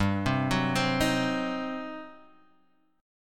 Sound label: G Major 7th Suspended 4th Sharp 5th, Guitar, Open F; arpeggiated